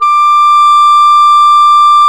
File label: Clarinets